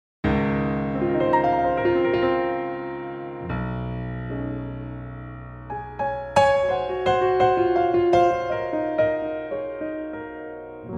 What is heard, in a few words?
Db piano